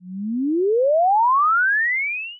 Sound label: ambient; halloween